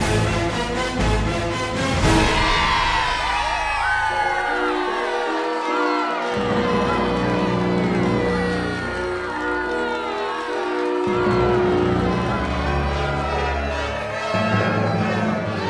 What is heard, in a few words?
Music Win; EyeToy Play Hero; PlayStation 2